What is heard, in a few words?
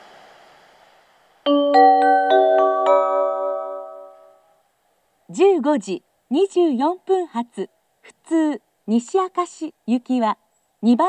local, station; tachibana; jr-west